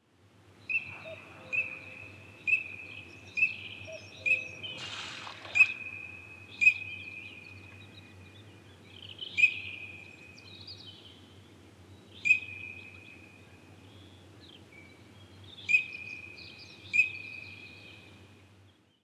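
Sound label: common teal anas crecca; utok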